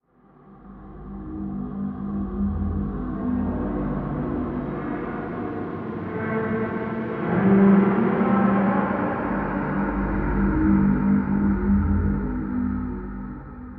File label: music - action; You Are Empty; totalitarianism3